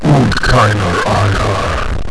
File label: pain; Alien Arena; nemesis